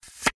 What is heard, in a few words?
deal card